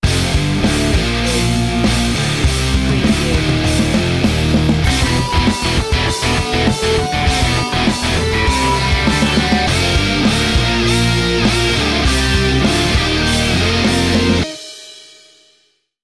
sting

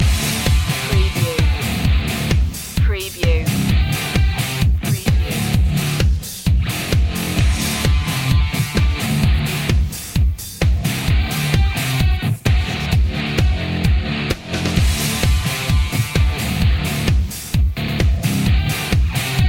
80s revenge loop

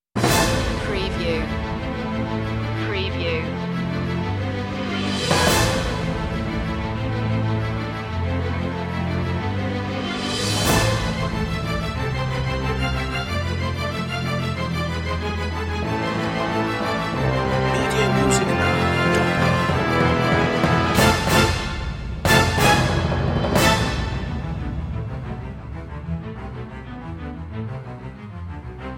no choir

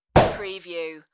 Hit Snare

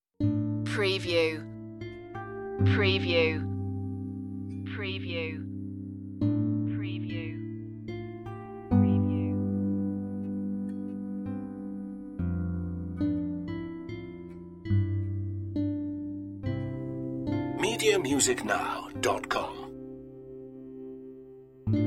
brushstrokes optimistic

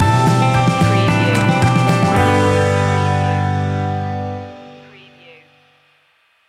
that folky feeling stinger

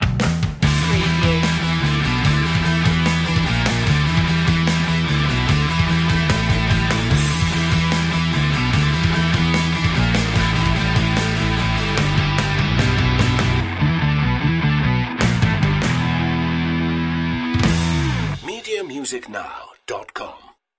nitro boost rock stinger

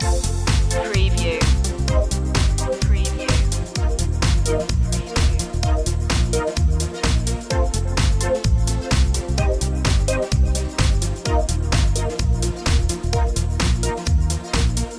up to this beat loop

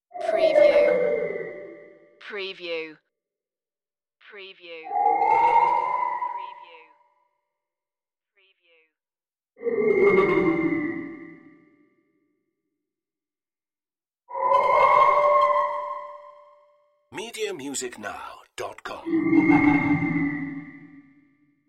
alien ghost breath

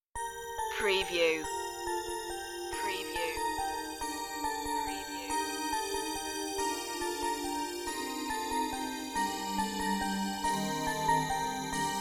carol of the bells bells keys

